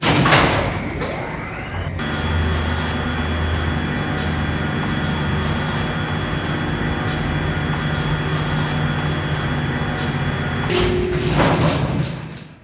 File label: oil rig elevator